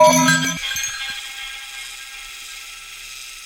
Scan, weapons; LucyCharm; tf2classic

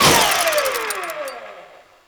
wind down; weapons; tf2classic

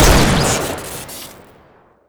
Scout Six Shooter Fire Crit, weapons; tf2classic

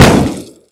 insanerator shoot; weapons; tf2classic

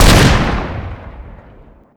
judge death shoot, weapons; tf2classic

